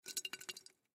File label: Can Shake Liquid Slosh Aluminum Empty; Shaking; Foley; Objects; Blastwave